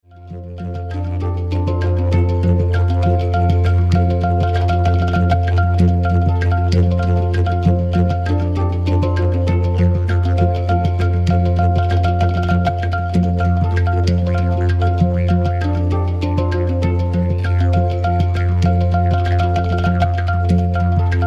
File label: Hang Didje